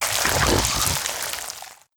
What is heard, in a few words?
Carrion Grub Death